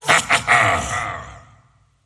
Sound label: laugh; skeleton king